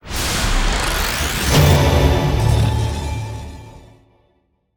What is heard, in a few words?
Team Victory; Yu-Gi-Oh! Master Duel